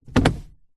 vehicles car gear transmission stick manual movement into gear engine off; zapsplat